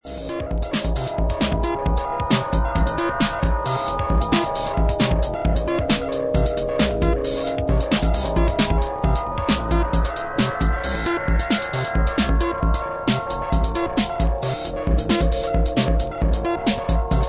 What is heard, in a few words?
AMBIENT EXPERIMENTAL